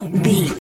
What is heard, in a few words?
dog growl